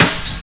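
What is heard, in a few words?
ROCK 132 snare drum, Big Beat Drumloops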